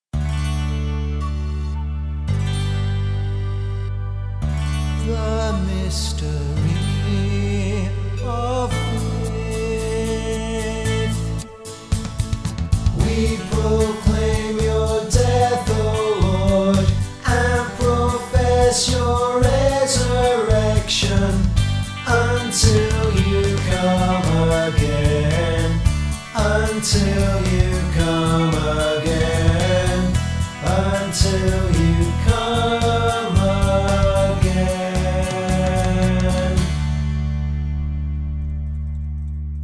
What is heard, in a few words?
We proclaim your death vocal